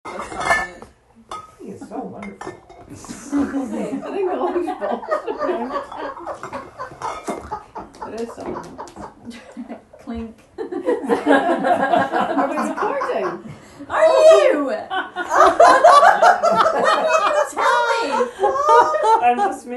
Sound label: Laughing audio